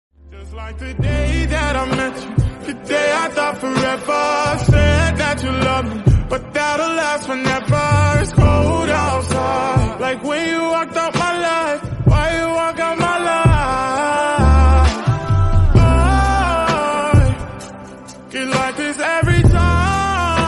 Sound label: remix ringtone